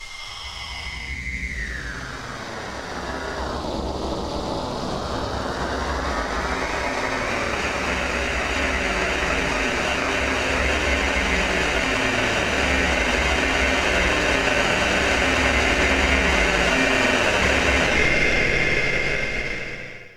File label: Industrial